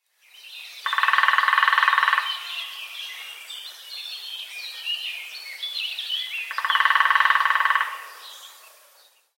Buntspecht Eichhoernchen; natur; bekannte kinderlieder in der natur

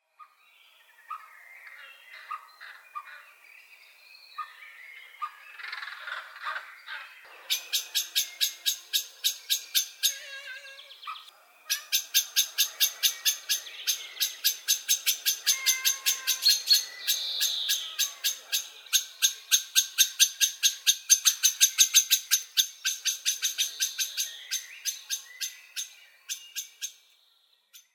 Amsel Katze, Vogelstimmen

